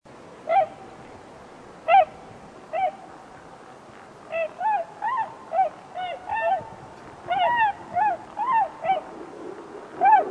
tundra swan; Tundra Swan overhead at night, Devil's Lake SP 11/19/03; Tundra Swans overhead MP3

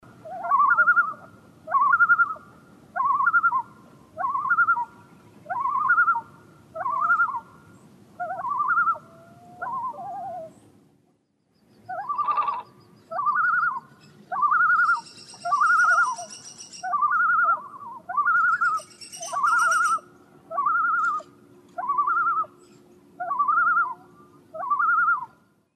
loons; mendota

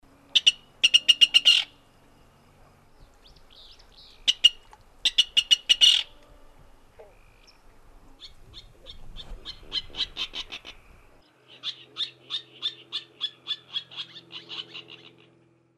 virginia rail; Virginia Rail (east of Mud Lake)

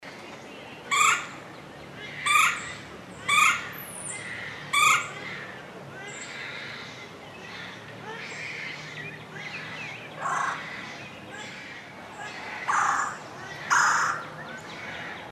wp cry; Woodpeckers, baby crying; Are those 2 different Red-bellies?; Red-bellied Woodpecker

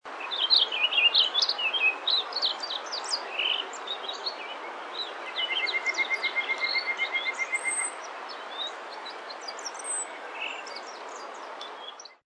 purple finch; Purple Finch, Pheasant Branch 4/28/04

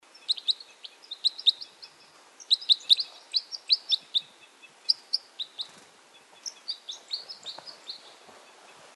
fly overhead; Red Crossbills fly overhead

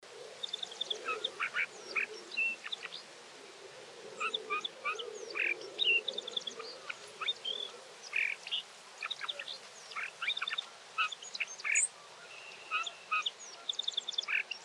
mystery sparrow

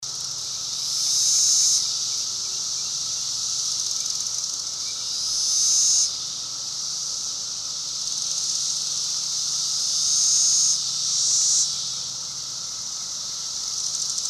cicadas

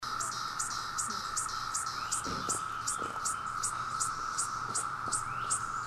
cicada sprinkler; Cicada sprinkler-like sound; Brood X 17-year Cicadas, Bloomington, IN 6/5

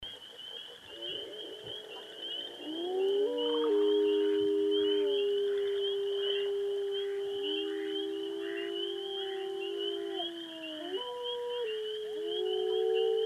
wolves; Wolves (or coyotes?); Crex Meadows 5/4/04; Upland Sandpiper

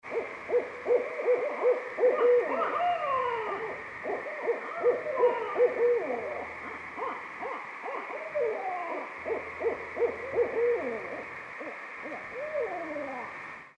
barred owls; Barred Owls (13 seconds); Barred Owls going nuts, 6/7

